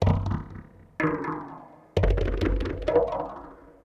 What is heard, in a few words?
PERC LOOP ETHNO 125; eJay